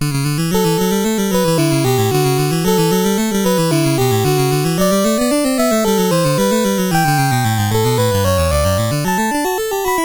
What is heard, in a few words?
Gradus ad Parnassum, CSG8580R5